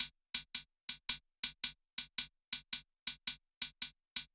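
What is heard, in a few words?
Distorsion Kit, downbeat electronica drums; ударные и барабаны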